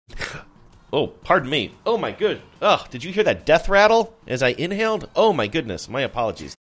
making death rattle sound saying oh pardon me oh my good ugh